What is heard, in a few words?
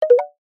multimedia tone short select three tone wooden